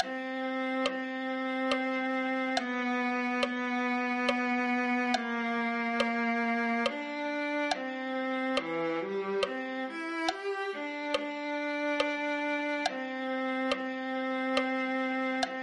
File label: viola